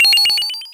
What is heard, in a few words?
coin cluster